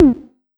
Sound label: Lo Mt, 130bpm; Zero-G; Soundclash Nu Dance Electro; Construction Kits; Feet First